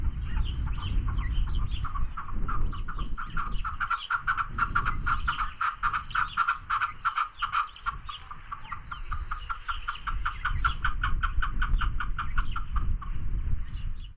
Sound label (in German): steinhuhn